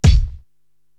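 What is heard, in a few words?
bass drum Deafness, Korg TR-Rack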